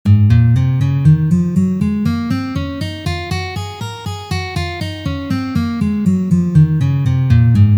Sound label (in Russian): Gamma Do Diez mazhor 1 pozitsiya